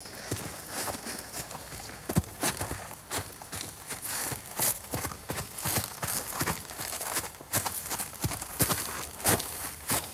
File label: hooves shifting through dry crunchy hay; vegetation